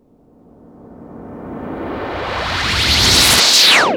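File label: FX left, attacks; Best Service Advanced Media Trax Modern Composer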